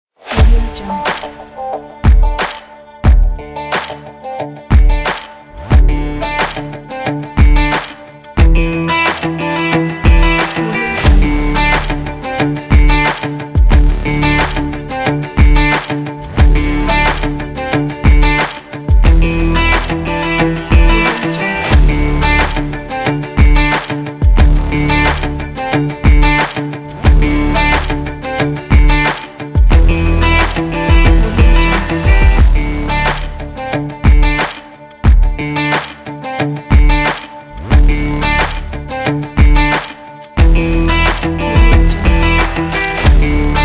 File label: corporate sport; sampletunes